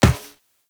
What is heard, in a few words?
Walk Metal Perforated, Footsteps; Operator